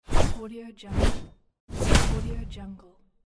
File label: fire sword